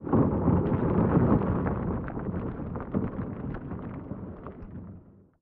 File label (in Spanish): sfx roca cayendo; Bunnicula - The Cursed Diamond